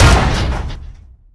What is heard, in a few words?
door Break; Barotrauma